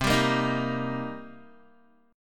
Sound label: C Minor 7th C Guitar; Cm7 strummed; 7,10,10,8,7,x